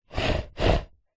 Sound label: horror monster snorts x2 nasal; zapsplat